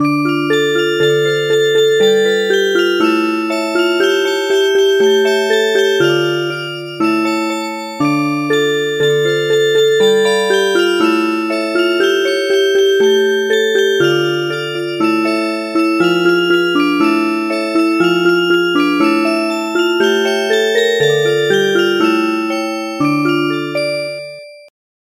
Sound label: Norwegian Lullaby Music Box